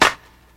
subtle reverb clap sound d sharp key, clap tuned to the D# note